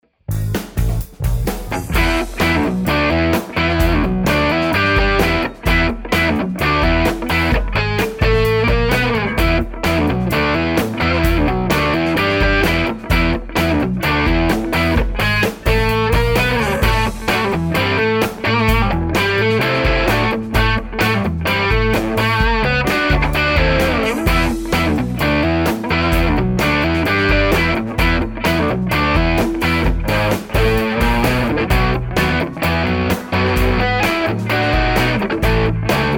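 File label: classic highgain neck, humbucker; schwarzbach; HB59-Classic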